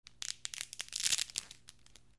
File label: RIPPING